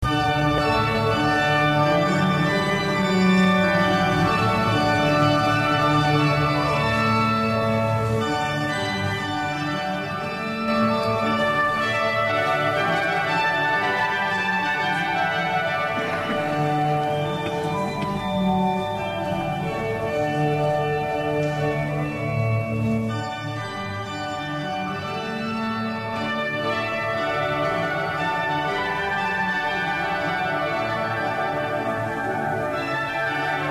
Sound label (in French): Orgue; Concert de CreweNantwich - ACJ Macon (Dir: Stephen Buckman); MJC Héritan MACON